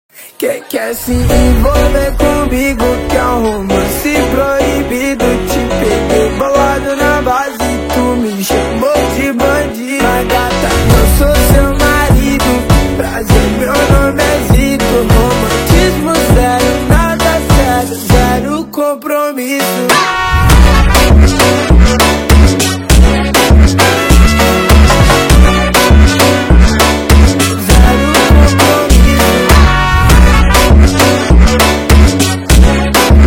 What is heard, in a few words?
ROMANCE FUNK